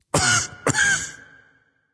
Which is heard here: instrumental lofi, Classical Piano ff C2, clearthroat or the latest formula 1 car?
clearthroat